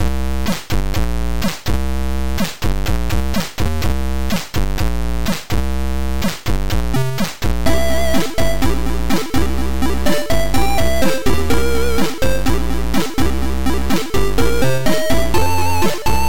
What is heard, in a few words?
music